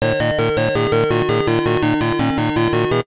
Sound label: Puzzle Clear